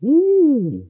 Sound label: hoot